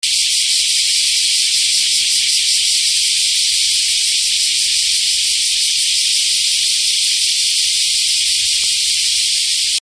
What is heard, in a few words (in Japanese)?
kumazemi; insect